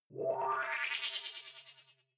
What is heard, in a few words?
sci-fi transmission incoming; swooshes-swishes